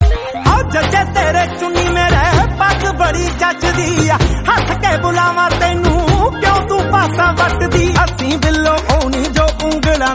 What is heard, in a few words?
Punjabi, ringtone